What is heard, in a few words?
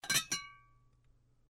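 Opening tobacco jar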